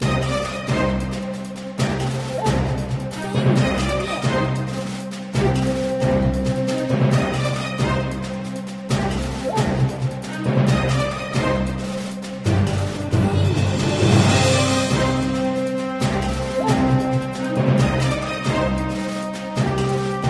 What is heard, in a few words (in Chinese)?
战斗音乐